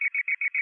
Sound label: comm code; federation